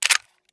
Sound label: elite clip out, weapons; cstrike; csgo